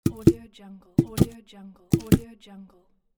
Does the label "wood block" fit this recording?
Yes